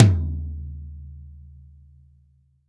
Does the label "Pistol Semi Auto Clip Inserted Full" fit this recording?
No